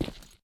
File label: default, step